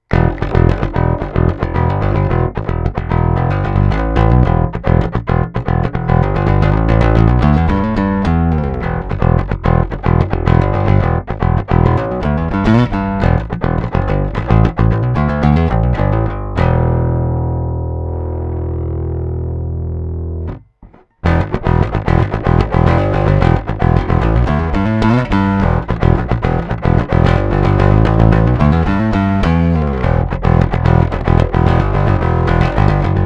Uebertreiber Overdrive

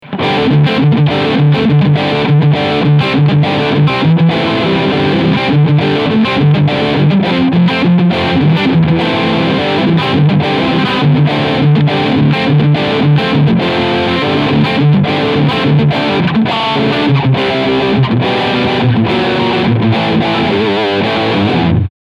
Steg PU Overdrive Riff

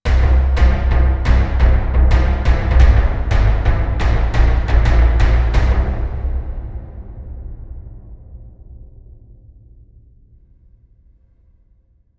Roland Gaia2 kann auch Drums

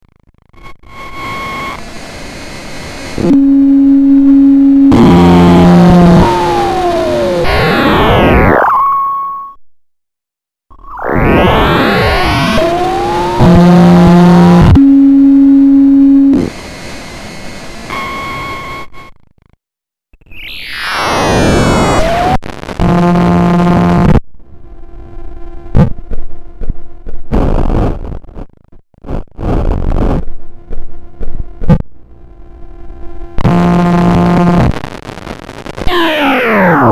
Drum engines durchlauf mit LFO; Bastl; Drum Kastl

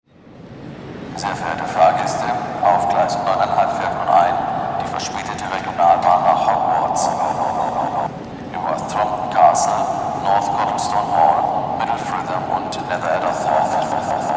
Bahn

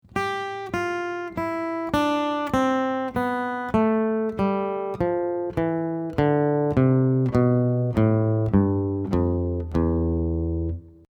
Phrygisch absteigend

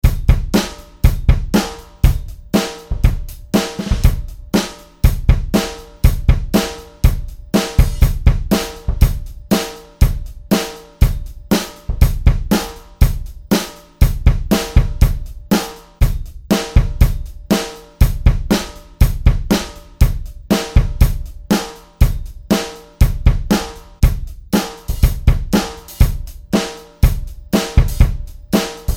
Rock Style AD Kit 4 verschiedene Drummer

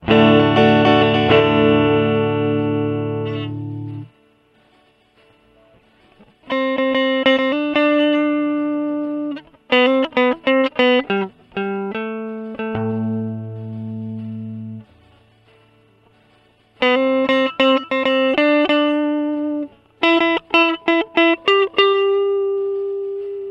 Boss DE-200 Digital Delay Gitarre Looper

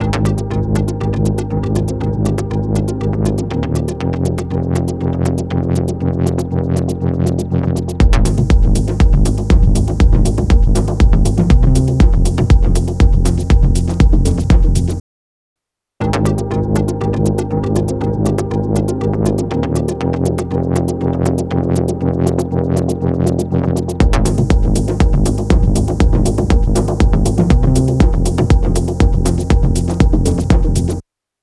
STEREO BUS eqing Beispiel Sättigung Band 1

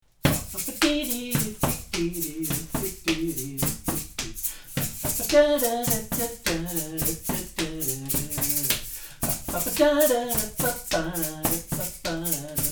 ELA 251E Kugel

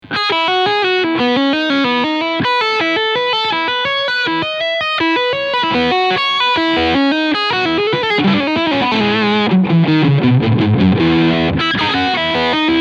Traveler Guitar Crunchsound Steg und mittlerer Pickup; TR VAIBRANT

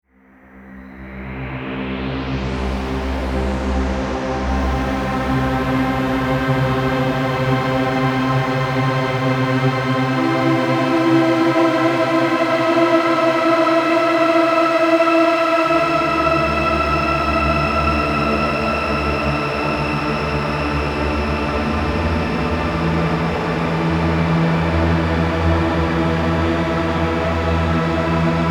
DM12D Shimmer Effekt

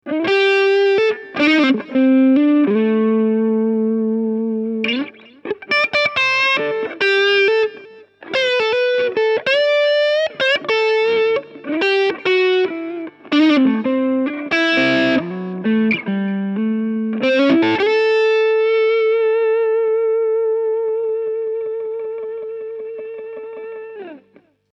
hals verzerrt

